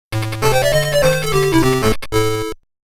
Miss; Legend of Joe Musashi Shinobi Music Collection

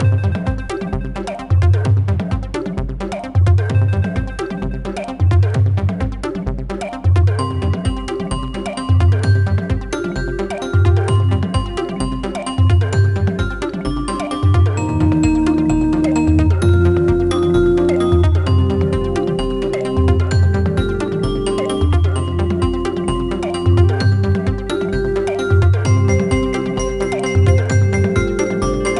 Music

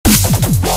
Dubstep

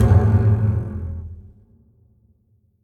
Stingers; Outlast 2; PS4 Windows Xbox One

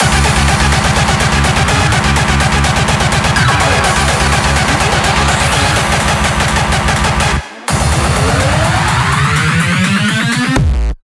Machine Gun

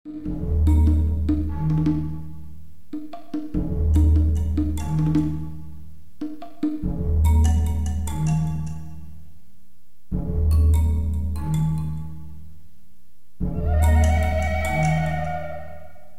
swamp at night; Gothic

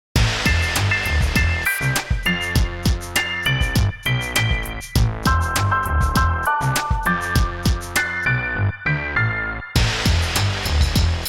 Electro Pop; The Sims 2; Nintendo DS

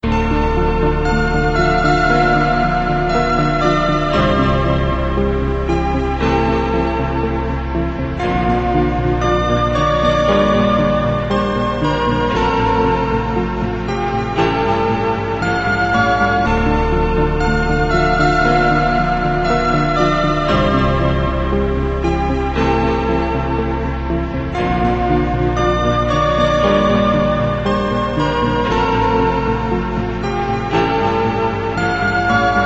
slow dance

